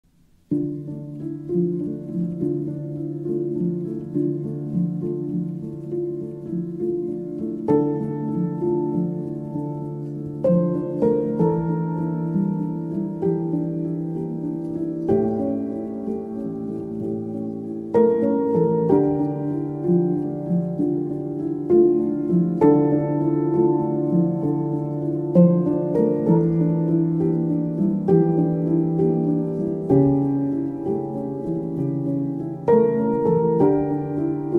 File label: Relax Music; sleep